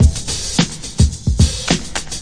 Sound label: classic break, drum loops and breaks